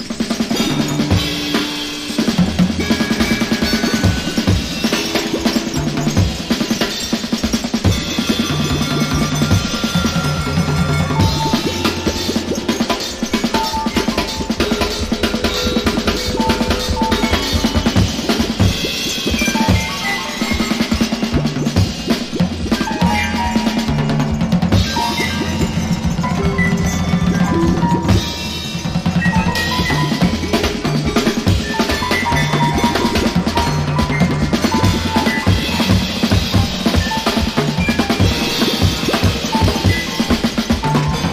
psychedelic percussion

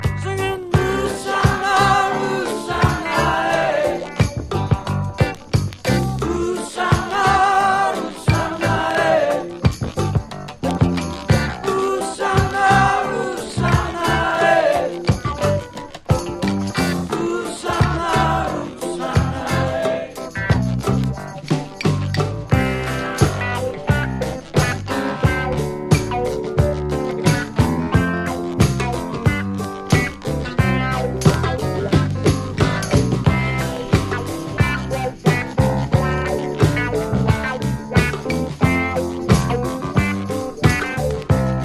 REGGAE TUNE

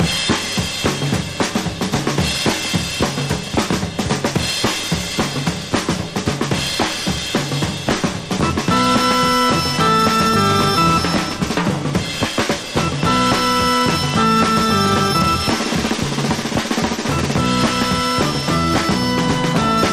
DRUMS

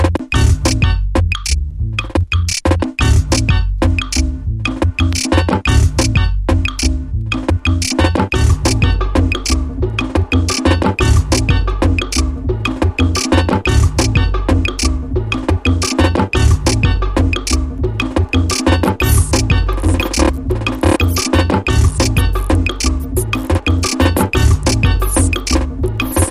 CLAVE RUMBA FUNK; latin

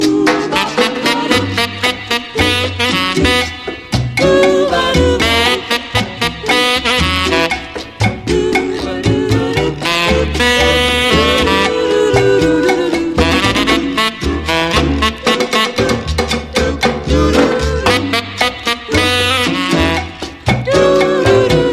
SAX GONE LATIN

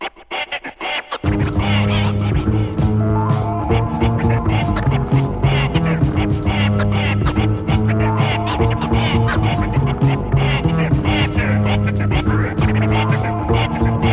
HARDCORE INSTRUMENTAL; 1997

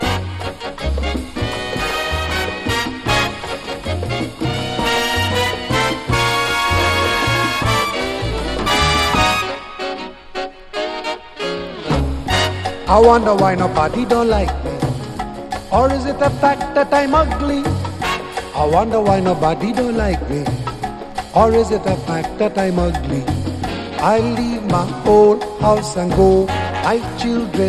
CALYPSO IN BRASS

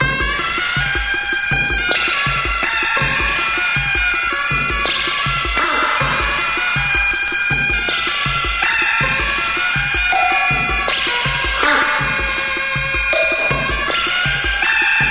PIANO WESTERN

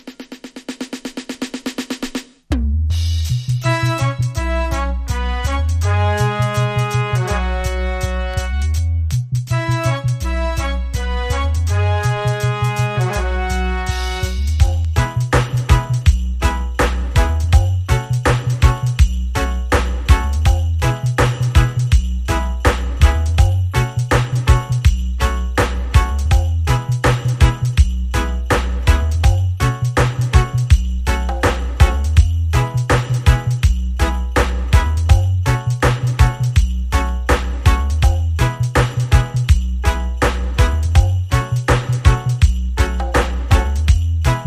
REGGAE CHRISTMAS MEDLEY INSTRUMENTAL

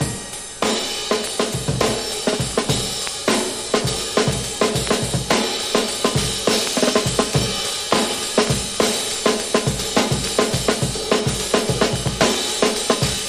DRUM BREAK; rare-groove

